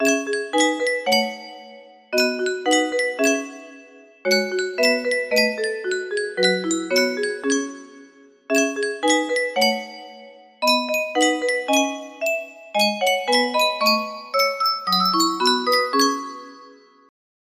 music box cover; undertale

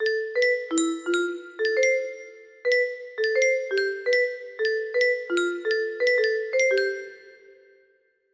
musical locket lullaby; shadowcreed

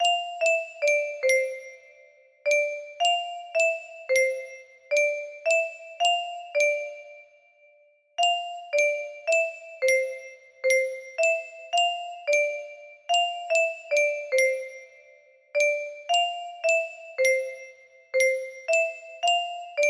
westminster clock, music box

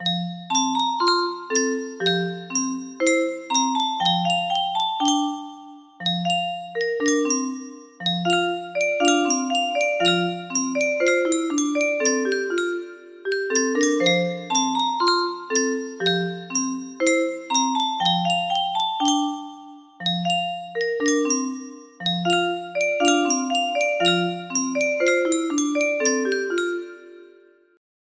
music box; phantom of the opera

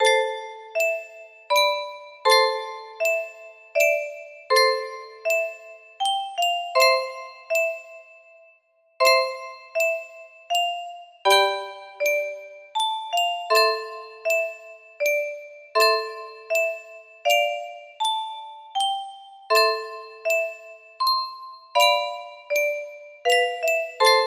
music box 15 notes

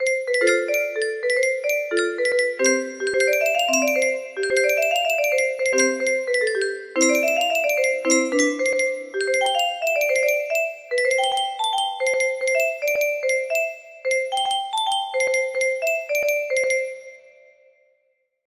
music box

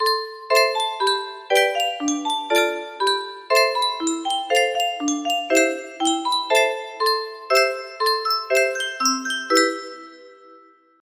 music box

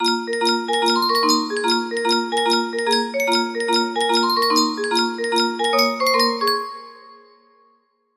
jaunty loop

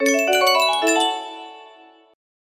game sound

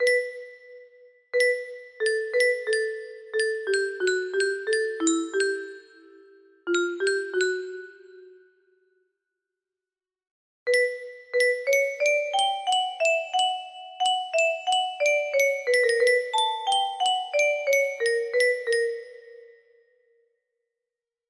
high piano

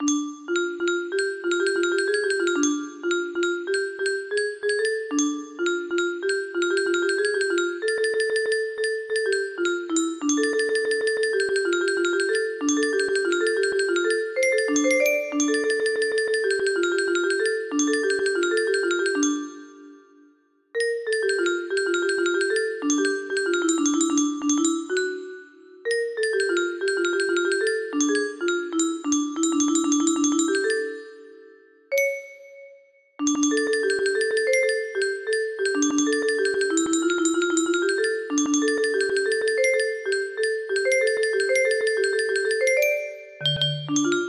whistle, music box; osomatsu san

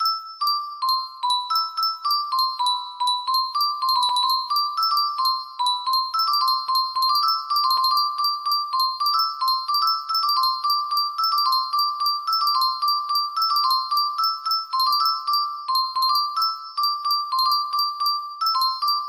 hi pitched melody, music box melody

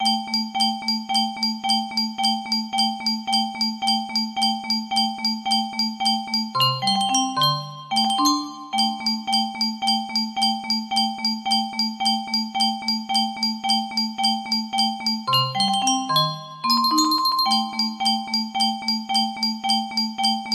anticipation music box

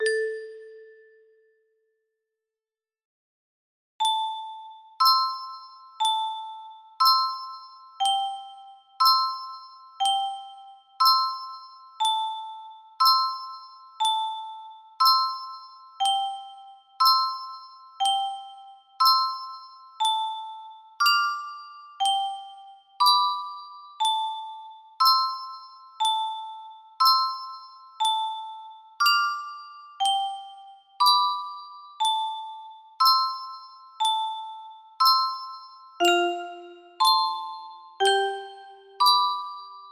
musicbox; child of light

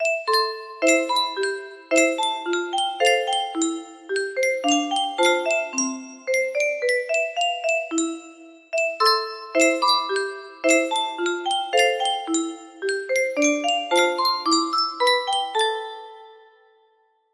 music box

